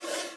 Soft Slide Short

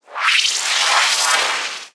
Long Sci-fi Transition

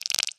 sci-fi rattle

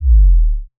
Clean Bass Drop

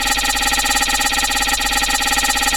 Looping Sci-fi Rumble

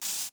Spray Hiss

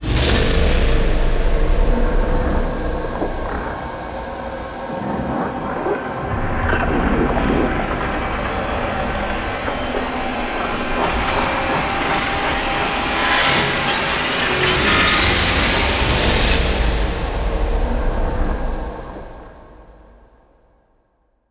Tense Evolving Blockbuster Approach

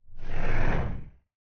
Swoosh Transition

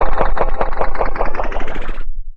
Accelerating Sci-fi Rumble

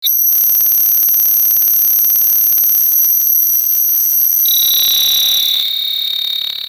Digital Buzzing Rattle Glitch

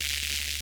Buzzing Power Glitch

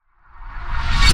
Swooshy Approach

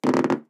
Hollow Rubber Creak

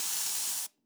Spray Hiss

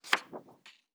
Rustling Paper Page Turn

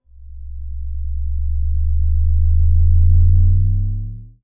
Clean Bass Bend

